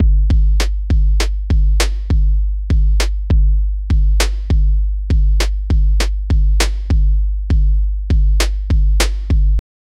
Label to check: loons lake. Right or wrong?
Wrong